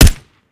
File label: mike4 sup, weapons; gmod; viper